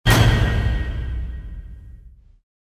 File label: Battle Orch; Gothic Storm Music